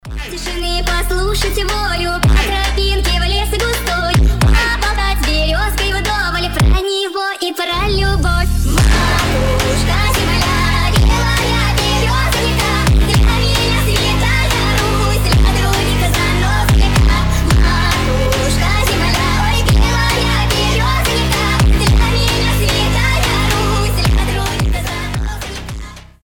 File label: FUNK